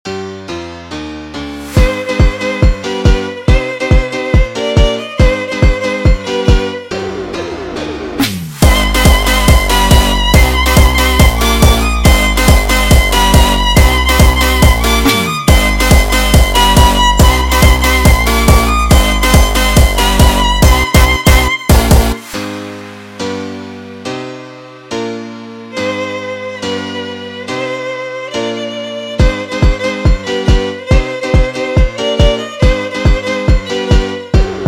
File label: sped Up